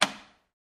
Button Pushes Metal

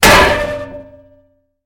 Tin Can Impacts Dull; pots & pans

